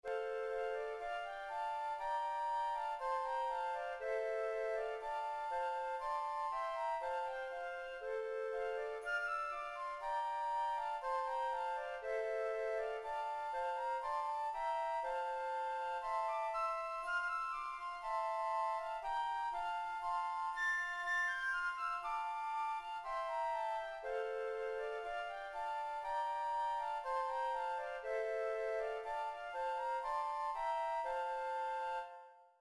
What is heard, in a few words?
Flutes